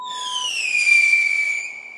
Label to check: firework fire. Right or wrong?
Right